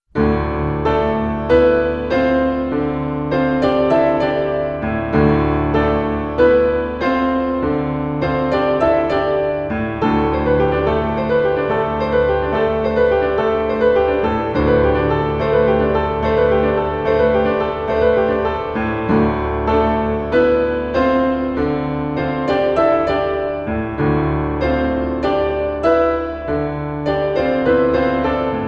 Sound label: piano